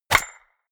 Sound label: golf ball hit